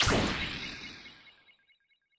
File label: Drone Card Played; Battle Suit Aces